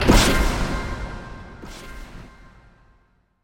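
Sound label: sounds of endings outro, disappearance fading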